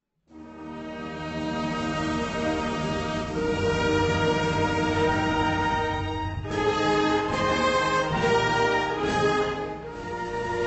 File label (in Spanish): himno de andalucia marcha; dia de la bandera de andalucia 2024